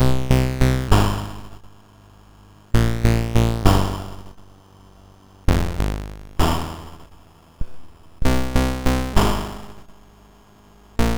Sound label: MOS6581R2, sid; Magic House